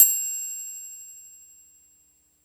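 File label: Open triangle, Roland MC-303 Percussion; Drum Samples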